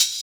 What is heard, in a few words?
Hhat, Hihat; Equipped Music; Loungin House